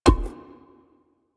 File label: Grenade Land; Warframe; Vehicles; NechroMech; Abilities